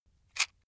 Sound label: matchbox rattle